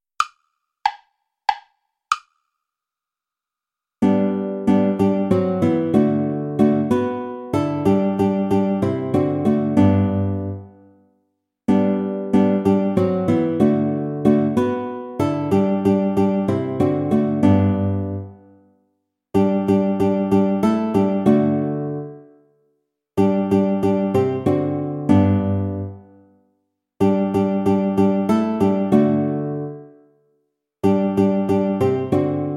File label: play along without guitar; asikhatali; traditional